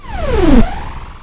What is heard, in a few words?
theme; cyber